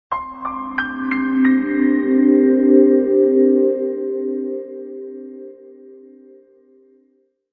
jingle

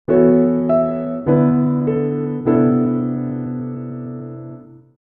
jingle

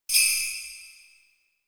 xmas suzu

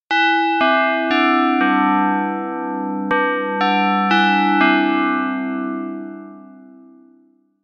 chime

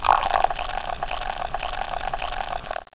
pot

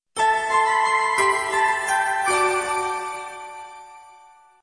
soft

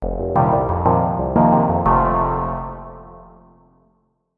jingle

